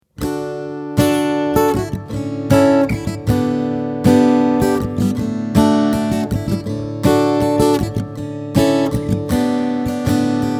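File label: strumming, Westerngitarre; Baton Rouge AR22S/ACE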